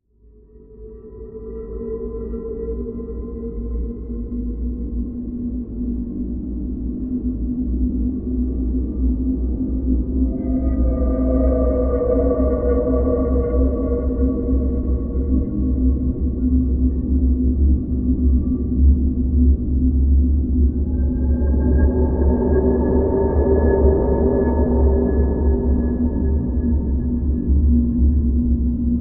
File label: Amb Cutscene